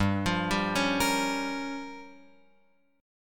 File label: G Minor 7th Flat 5th, Guitar Open F; Gm7b5; arpeggiated; x,2,5,2,4,5